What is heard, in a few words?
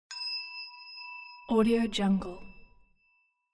ding